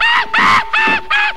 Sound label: chimpanzee; Animals